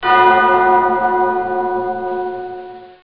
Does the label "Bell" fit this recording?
Yes